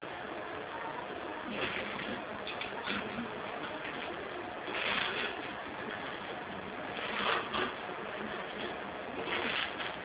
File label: long low